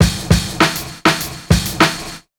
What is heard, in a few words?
dog; transformationcentral; transformationmusic